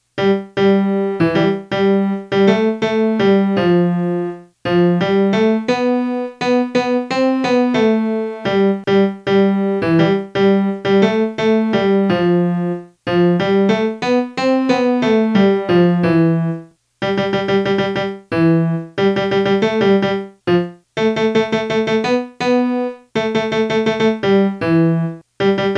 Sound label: melody